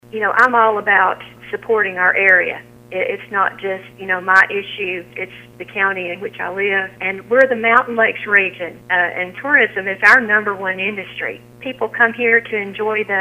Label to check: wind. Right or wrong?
Right